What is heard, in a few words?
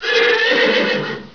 horse; cs wildwest